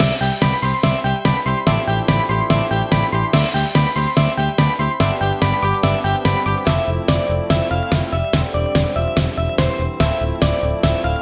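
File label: instrumental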